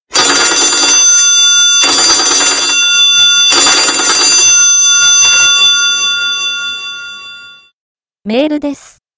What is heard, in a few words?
ring mail